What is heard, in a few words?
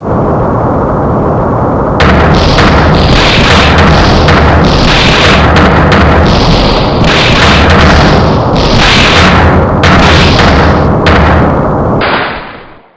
breakdown; furio